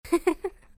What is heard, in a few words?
woman laugh